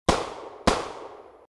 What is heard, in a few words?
Pistol 38 Calibre Shot Sound Effect